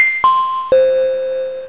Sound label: Celeste; Amiga 8-bit Sampled Voice